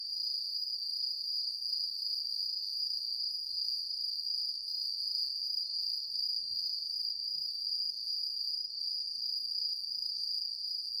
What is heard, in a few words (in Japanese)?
鈴虫の鳴き声, nature; kankyou